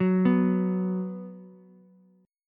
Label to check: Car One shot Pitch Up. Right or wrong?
Wrong